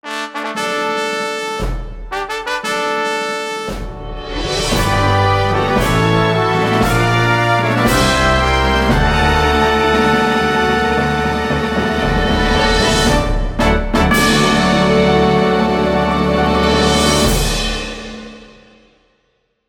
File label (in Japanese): 優勝ファンファーレ